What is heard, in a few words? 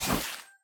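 attack pickaxe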